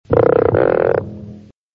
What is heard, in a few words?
frog croak; bugsbunny